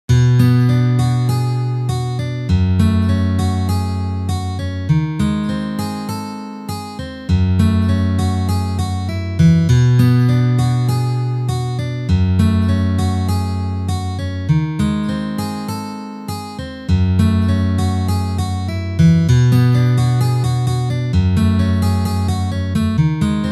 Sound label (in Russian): Melodiya iz Stalkera na gitare, gitare